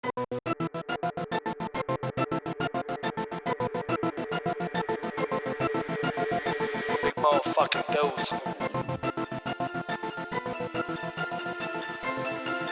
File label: beat battle